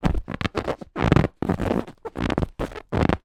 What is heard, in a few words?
lilo rubber ring squeaks movements